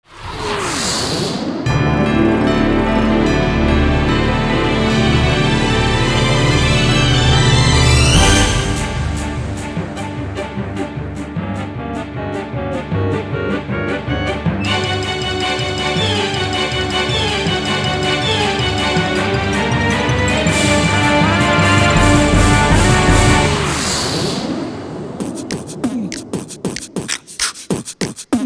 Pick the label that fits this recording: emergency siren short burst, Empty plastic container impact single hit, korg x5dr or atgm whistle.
korg x5dr